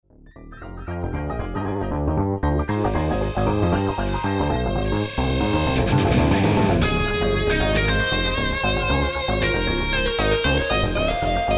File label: Guitar Solo